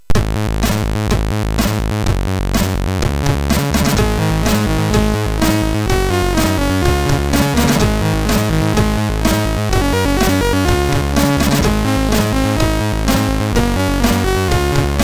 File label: MOS6581R3, GAMES; Shellshock